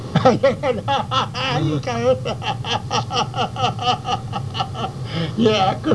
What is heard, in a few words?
laugh